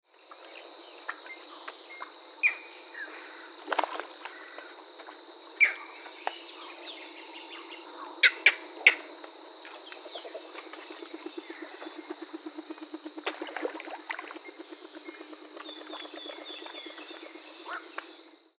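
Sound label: Great Horned Owl Pair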